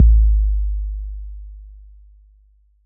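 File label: bass drum 808 Decay E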